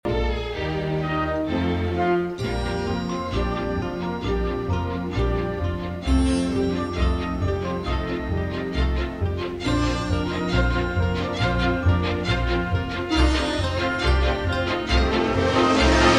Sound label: Instrumental; Audition Cut